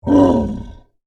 Monster Grunt, Horror, Monster, Grunt, Creature; Monsters

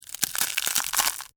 Crunch Sugar Cone, Soft, Waffle; Texture, Slow

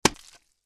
Impact Mud Hit Moist Surface; Low Impacts; Sound Effects:Impacts; Blastwave

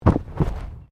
Body movement Fall Heavy

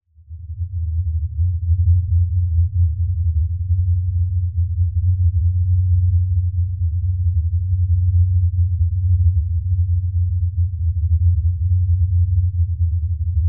Lfe Drone Low Bass Rumble; Blastwave

